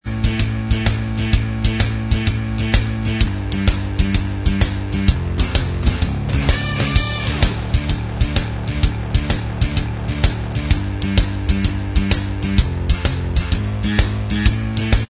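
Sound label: instrumental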